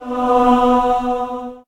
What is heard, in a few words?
magic holy miss; wesnoth